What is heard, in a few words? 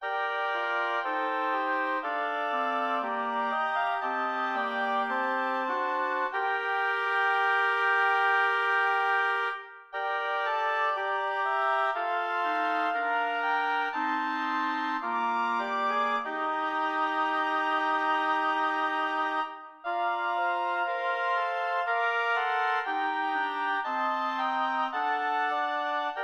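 lyrical oboe quartet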